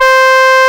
FM ALTO C4, HORNS; AKAI S-Series CD-ROM Sound Library VOL-2

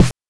BANK1 SD, NES DPCM; MIDINES

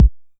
909 BD; Goldbaby Tape808 & Tape909; The Tape 909

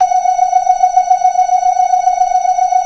90 BLOOD R 1, ORGAN; Keith Emerson Signature Series

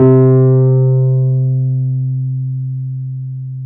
PNO T.Wurly C 4; Keyboards of The 60's and 70's

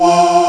AMB VOICE

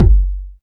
Kick, Alesis DM5; Drums

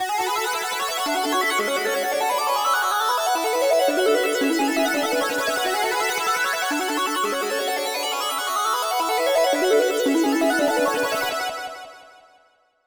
techy arp 70 BPM

